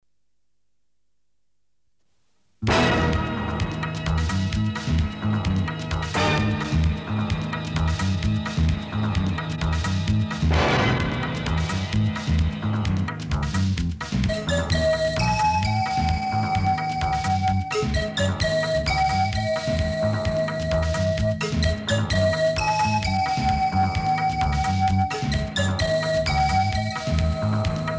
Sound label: Music; gunehgar kaun 1991